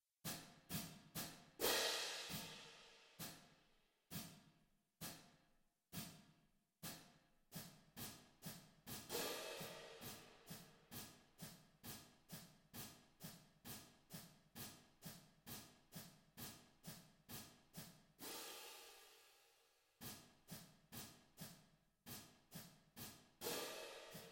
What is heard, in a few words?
cymbals